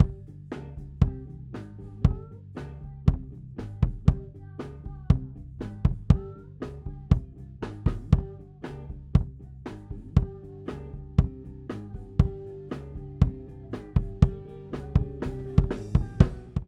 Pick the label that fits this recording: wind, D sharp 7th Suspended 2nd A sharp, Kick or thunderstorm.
Kick